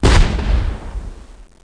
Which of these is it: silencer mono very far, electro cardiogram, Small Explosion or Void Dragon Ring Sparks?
Small Explosion